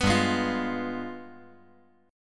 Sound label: G 7th Flat 9th